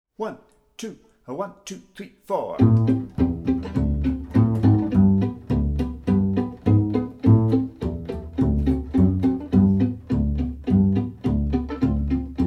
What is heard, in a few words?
backing track